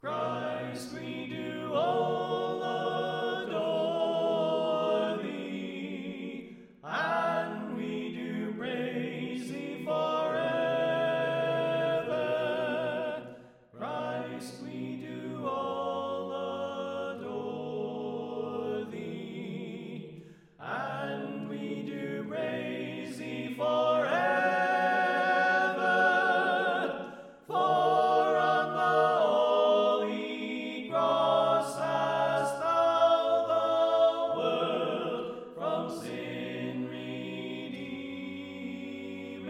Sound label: Alto Left